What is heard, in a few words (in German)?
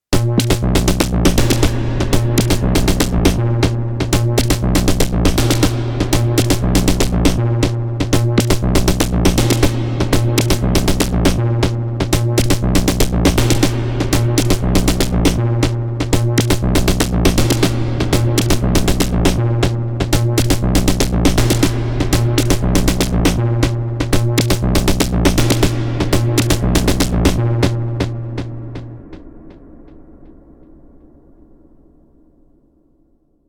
Elektron Sequenzer Parameter Lock LFO auf Pitch und Filter DEL REV